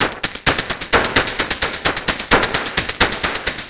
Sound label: Beat 22 8